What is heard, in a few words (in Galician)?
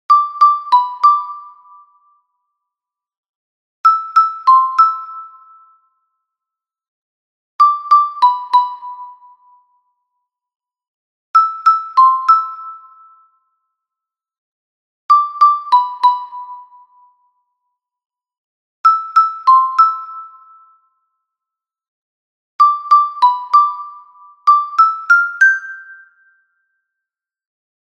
Xilofono Soprano; NON CHAS QUERO